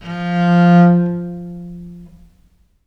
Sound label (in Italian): F2, Historical Instruments, VIOLE MUT; USB Soundscan vol.23; AKAI